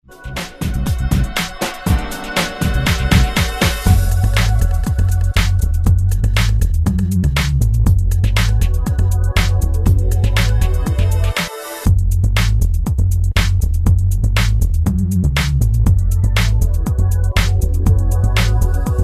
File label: Humble Match